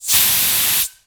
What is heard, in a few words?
Compressed Air